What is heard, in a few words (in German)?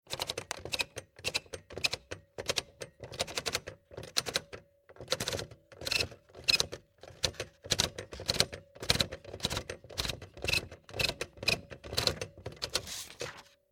Walzendrehknopf Bambino Schreibmaschine; 1950; Bambino children's typewriters